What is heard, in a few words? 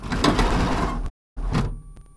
canopy slide, F6F Hellcat; Bombable; flightgear